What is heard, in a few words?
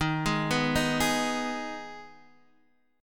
Eb Suspended 4th Sharp 5th Eb Guitar Drop D; Ebsus4#5 arpeggiated; JGuitar